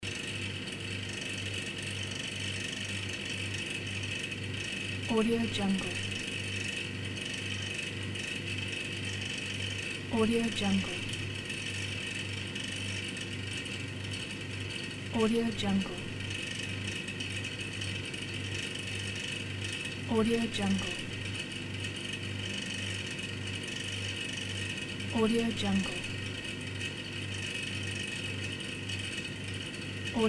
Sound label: fridge